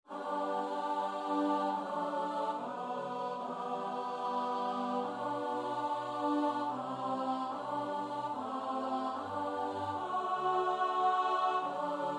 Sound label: Psalm alto